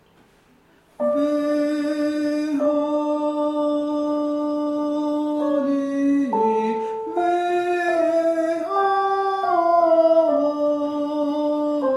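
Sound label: Tenor, chants; SATB; Vehadi; Peter Deunov; concert du 15 août 2009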